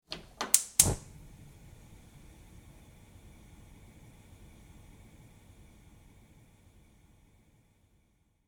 Household Stove Gas Ignite Run Off, kitchen; SFX Bible

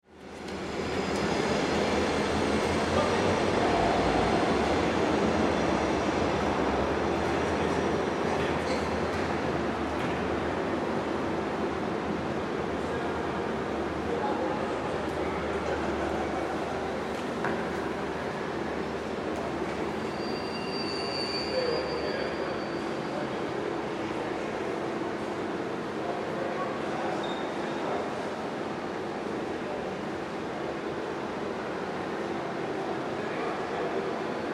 Ambience Train Station Denmark; Train Stations

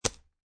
Poker Chip Dropping On Playing Cards; Poker, gambling. Poker chip dropping on playing cards; Card Sound Effects

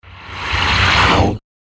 Hungry Ghost Monster; Ghost Sound Effects; horror sound effect

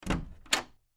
Door Open Latch Click; Objects; Foley; Blastwave

